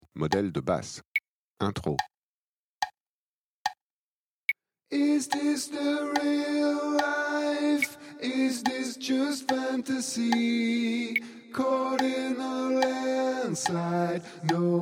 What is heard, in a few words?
Basse Intro